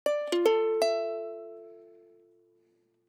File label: ukulele 120bpm